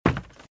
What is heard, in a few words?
Footsteps - Wood - Creaky - Run - Right